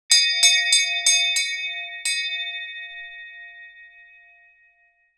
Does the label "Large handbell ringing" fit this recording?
Yes